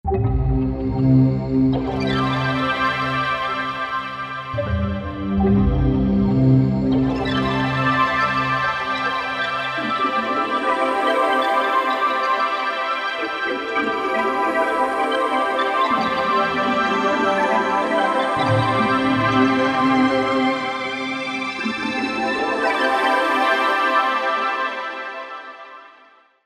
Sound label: Down River, Korg MSC-2S Synth; Synth 1